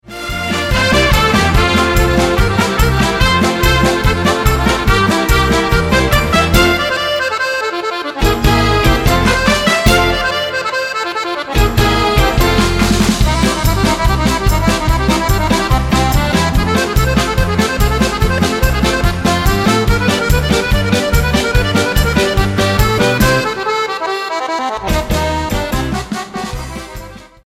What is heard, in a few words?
Crazy Accordeon